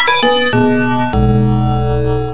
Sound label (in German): glocke; Wizard of SOund v0.9; Instruments